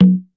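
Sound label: Drum Analog Kit Conga Low, Yamaha RM1X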